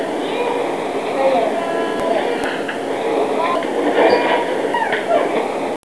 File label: child singing in my kitchen; evp